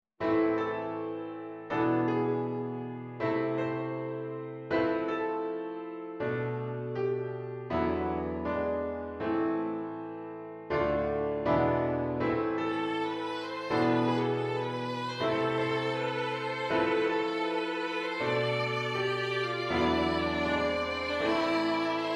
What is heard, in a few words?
double violin; double violin series set 1